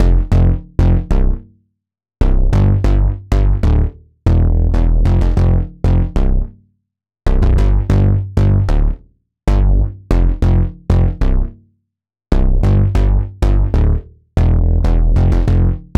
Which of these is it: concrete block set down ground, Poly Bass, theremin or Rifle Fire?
Poly Bass